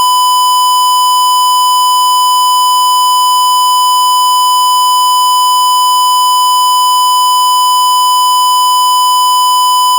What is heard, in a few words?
1k Square Wave